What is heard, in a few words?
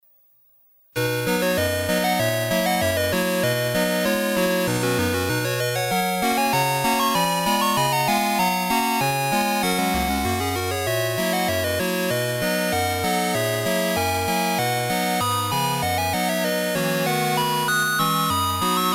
Polka; ALF